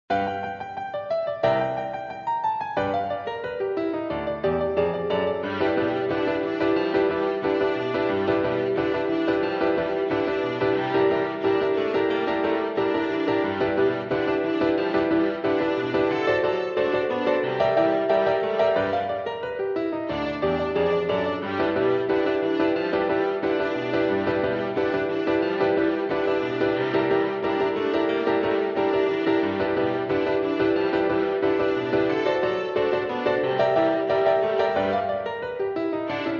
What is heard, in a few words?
Viola